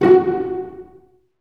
STR SEC, STRINGS; Roland - LA Composer vol. 1

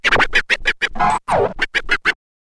scratch kit, vinyl

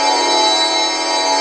G4 BELL, TURBOBELLS; Northstar - The Wizard VOL-1

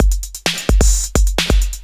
drum 130 electro, drumloops; Techno Trance Essentials

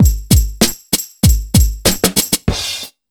97 BEAT, Pure Electro; USB Soundscan vol.03; AKAI

